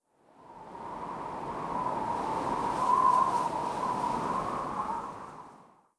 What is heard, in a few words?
Dust Wind; blue archive